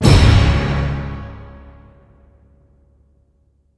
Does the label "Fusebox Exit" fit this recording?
No